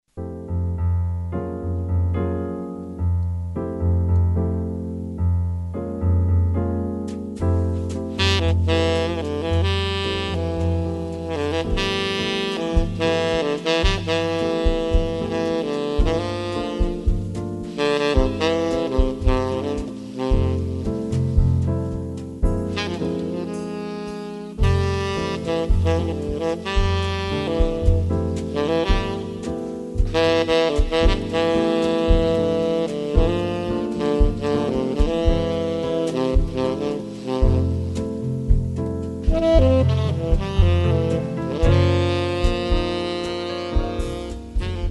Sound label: jazz